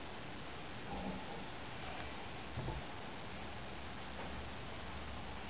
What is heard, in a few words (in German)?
Welle Kante zwei Tropfen